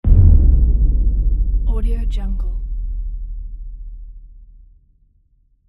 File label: impact